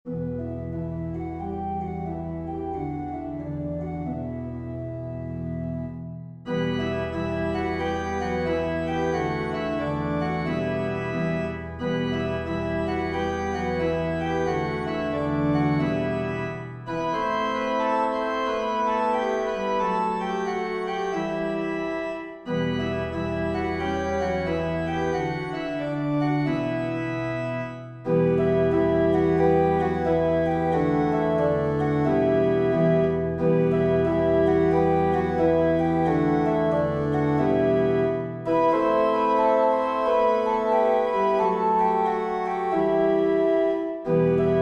Organ

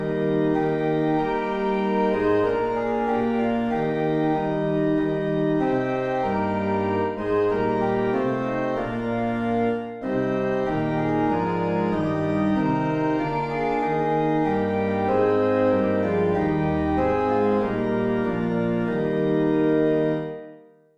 Organ

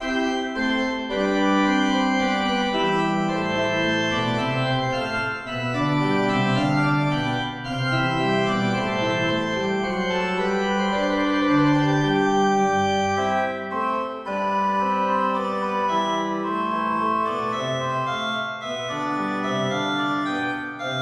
Organ Gavotte in C Major

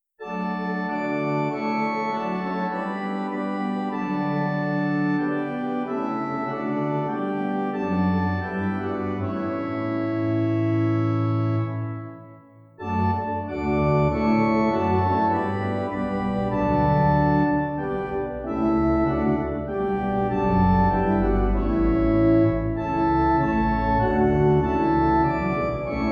Organ

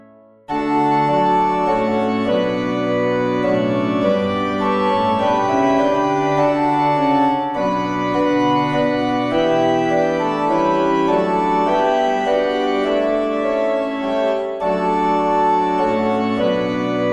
Organ

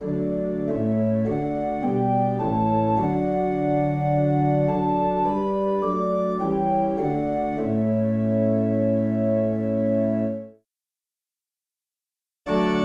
Organ

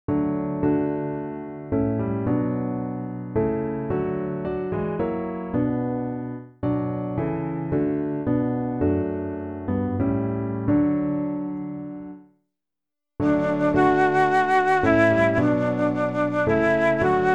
Piano Ensemble

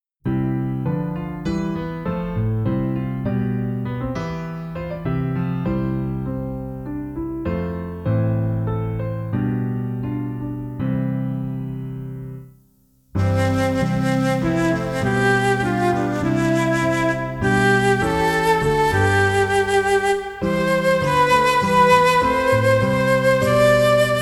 Piano Flute

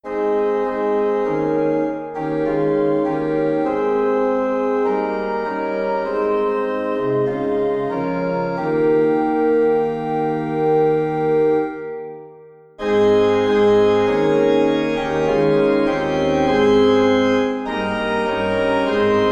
Organ

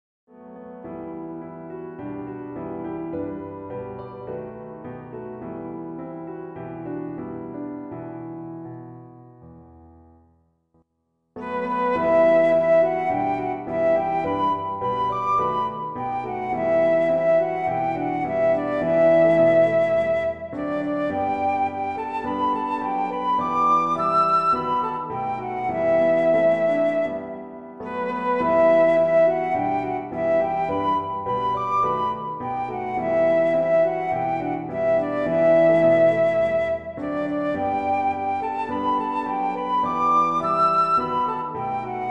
Piano Flute

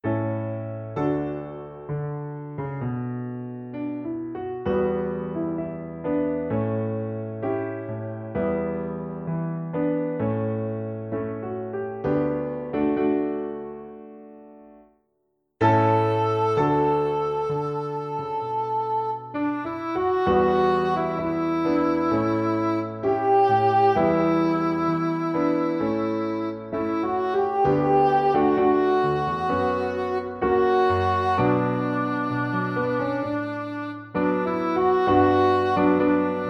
Piano and Wind

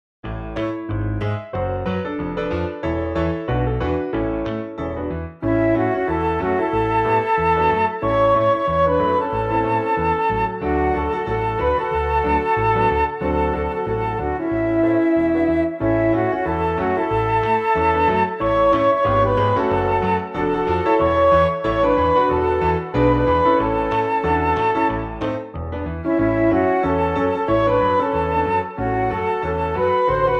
Piano and band

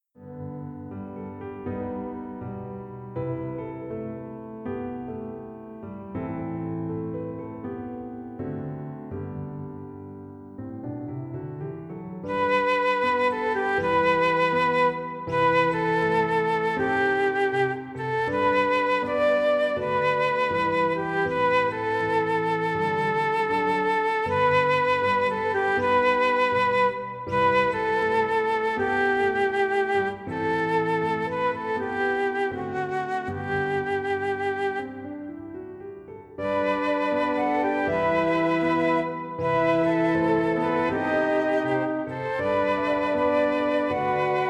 Piano Ensemble; 4 Verses

